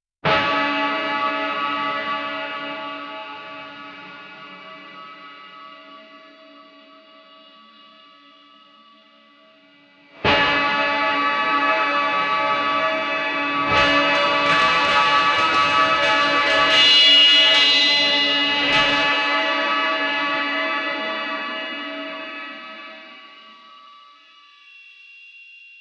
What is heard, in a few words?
sting building; static